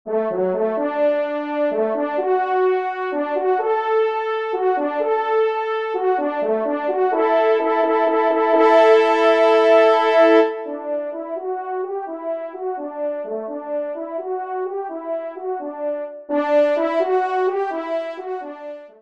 trompe; Le Daim

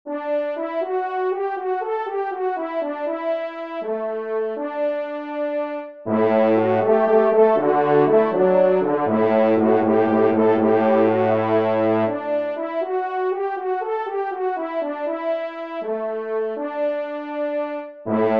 Fanfare; Loisirs du Chasseur 4 2e Fanfare; Pupitre 3° Cor; Henri Sénée

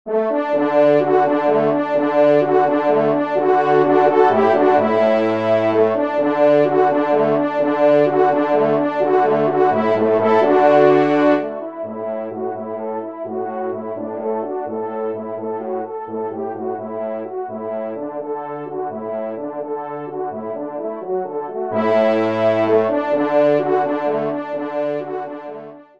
Allegro de chasse, trompe

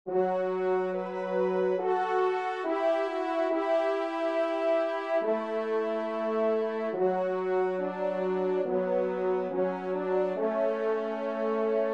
Kyrie, trompe; Devert; 2e Messe Kyrie

